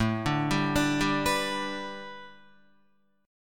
A 7th Suspended 2nd Suspended 4th, Guitar, A7sus2sus4 chord; A7sus2sus4 arpeggiated